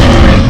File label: tyrannosaurus hurt; paleotest